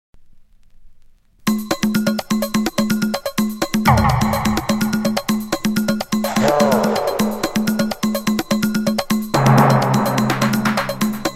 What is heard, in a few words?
Extra Bonus Beat